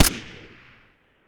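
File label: aug shot silenced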